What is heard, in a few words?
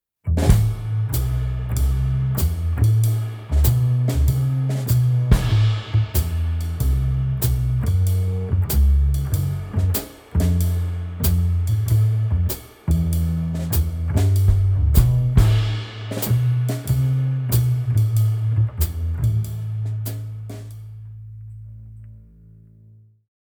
jazz drummer, jazz, swing; drumstudio studio hamburg schlagzeuger; filmmusik; johannes huth bass